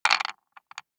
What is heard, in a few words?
DICE on Wooden Tray, Throw and Roll, Standard, 3 Three Dice; Samples; ArticulatedSounds; DICE Sfx Party (Extended)